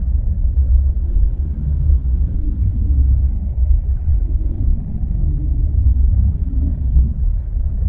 dimensional horror portal; Stellaris; guardians

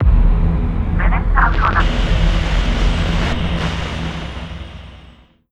comms cutoff; Stellaris; nemesis

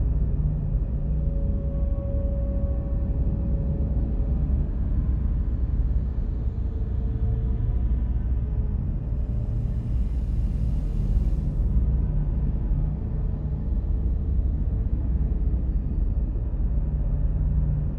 ship starbase idle; aquatics

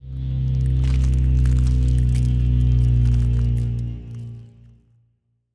tilt down long; biogenesis

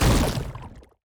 weapon proton thrower; Stellaris; grand archive; weapons

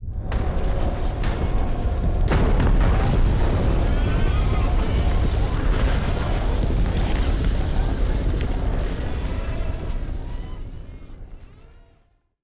nature shock; Stellaris; strange worlds

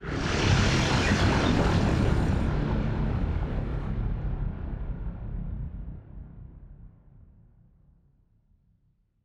ship science ship move; Stellaris; machineage

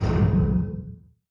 distance disruptor hit, weapons; Stellaris

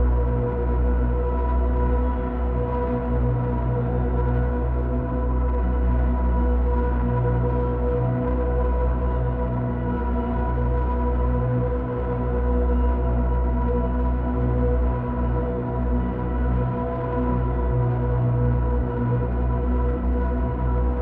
ship cruiser idle; ships; Stellaris; shroud

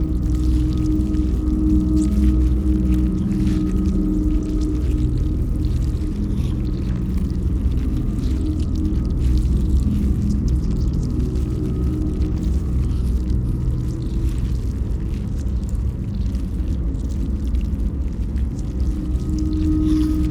ant ship starbase idle; biogenesis

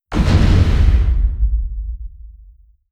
distance dragon wing attack; Stellaris; guardians

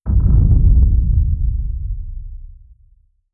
distance planet killer hit; apocalypse

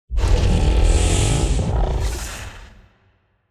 kaiju juvenile death; biogenesis

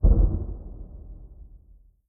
dimensional horror portal thunder; Stellaris; guardians

